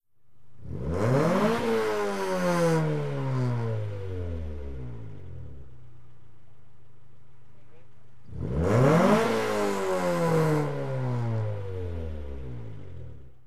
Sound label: Integra Two Dist Hard R, acura integra; acura integra ext.